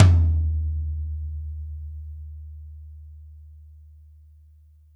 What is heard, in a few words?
Tom